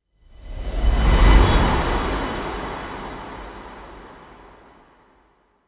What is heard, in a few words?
elec light explode; anemoi